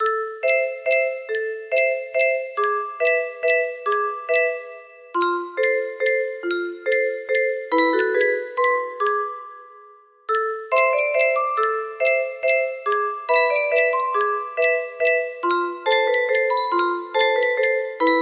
windup music box theme short

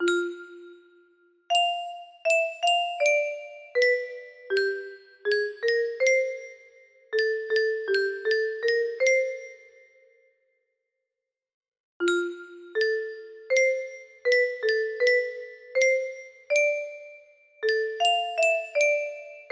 lullaby 80 bpm

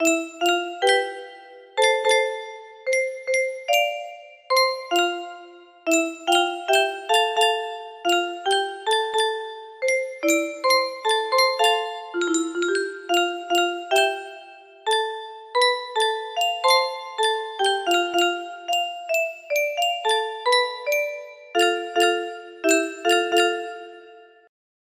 music box

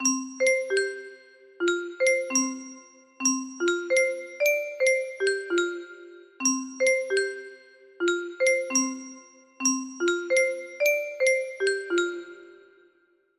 start menu, music box; undertale

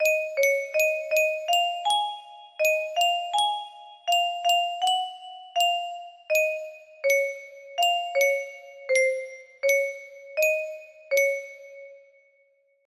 music box

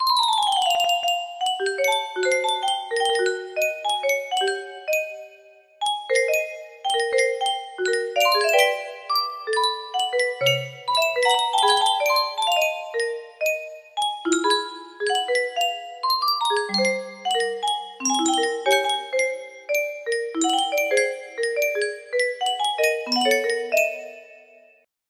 music box

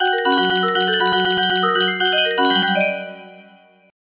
music box

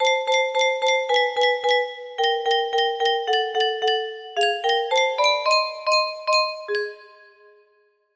some melody, music box melody

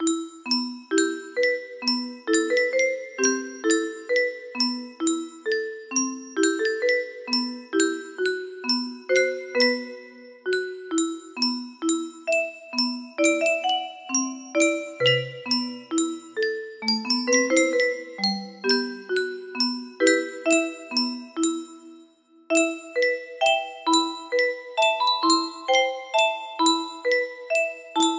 music box midi; fable ii

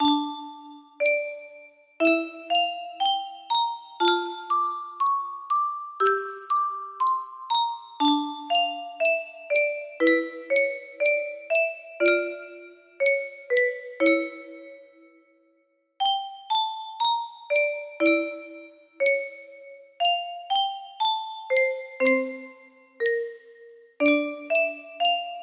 music box tune; clockwork mansion

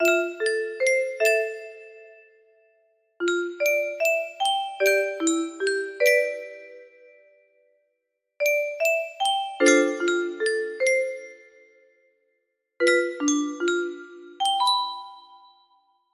dream end with chord

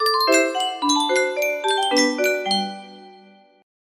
nokia ringtone, music box

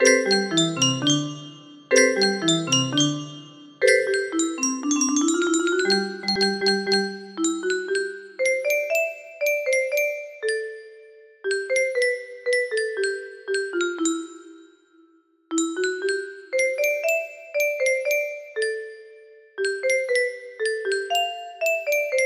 german march, music box; monika

